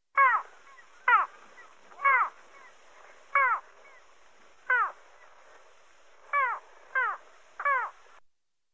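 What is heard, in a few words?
little gull voice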